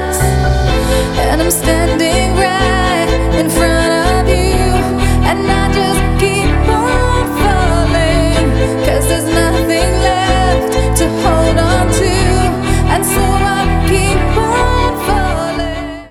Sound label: pop